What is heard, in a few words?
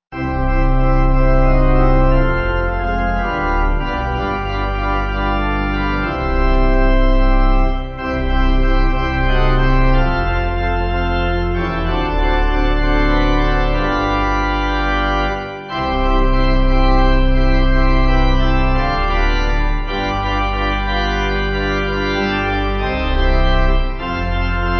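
Pipe Organ, Music Backing Files for Churches; Give Me A Passion; Fellers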